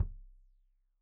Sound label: Kik Stereo; Deeply Sampled Drums